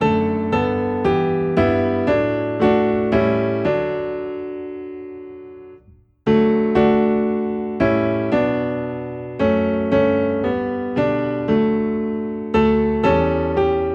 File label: Piano Low in D, hymns; When All The Mercies